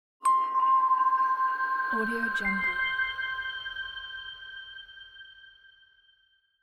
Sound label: logo effect; tibasound